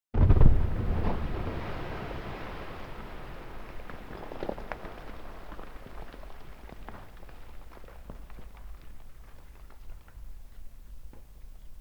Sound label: Explosion With Falling Rock; KMP Film Video Sound Effects